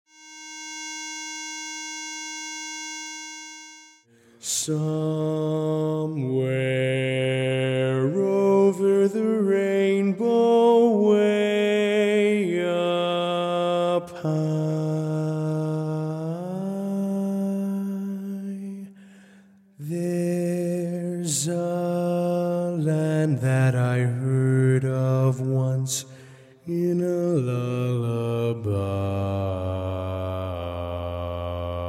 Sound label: bass